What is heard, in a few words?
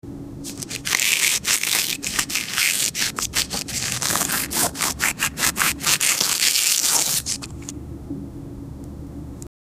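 sound effect of food rubbing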